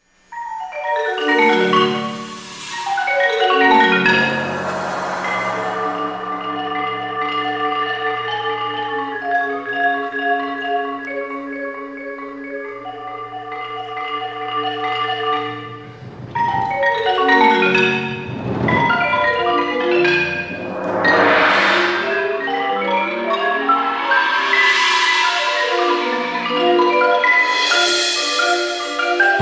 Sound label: perc ensemble